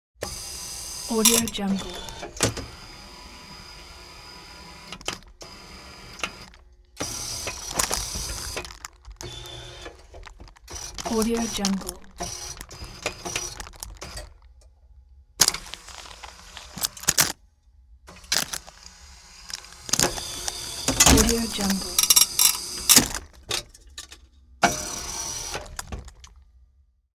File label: arcade claw machine being played; hollywoodedge